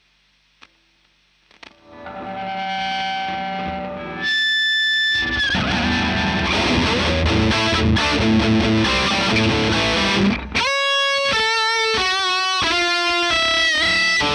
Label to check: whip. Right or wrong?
Wrong